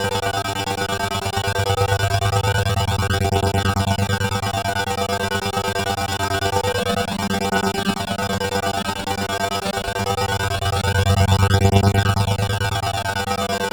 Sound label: TEKNO, Hyper Dance, FX; Best Service